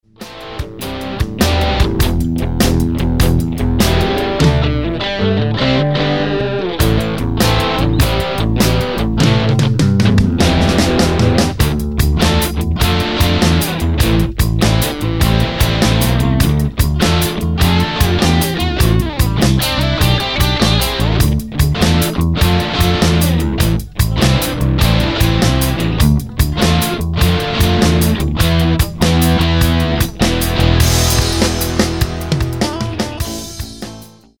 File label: guitar solo original bridge